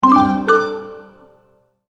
game start; RedHotRoast; GemCrushAssets

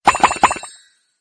coin fly; RedHotRoast; GemCrushAssets

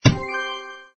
daub; BingoGrassland

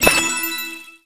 daily Bonus collect; BingoGrassland

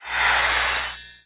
prop fly; BingoGrassland

